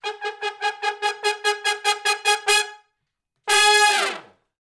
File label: Shuffle Blues Triplet Riff (Ab) unison, Horncraft for R&B; Crimson Blue and Fabulous Horncraft 4 RnB; Sections